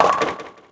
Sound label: Bowling